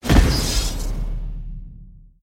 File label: Explosive Electric Accent